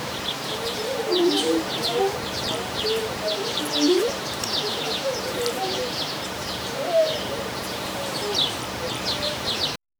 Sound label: peaceful village daytime